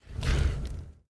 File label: grunt; fenrir